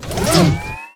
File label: Pickup Energy Pack Scifi; Energy & Forcefields